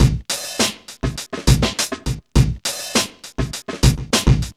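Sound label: 102 bpm, Funky loops; Zero-G; Funky Elements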